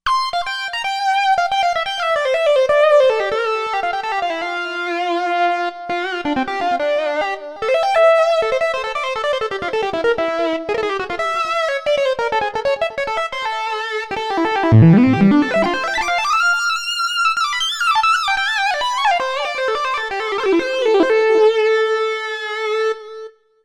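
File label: Lead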